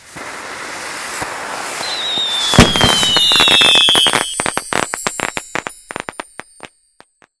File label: fireworks